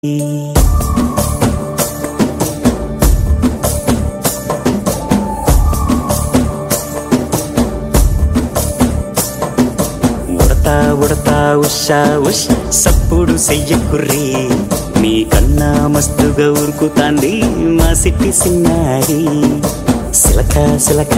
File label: Ringtone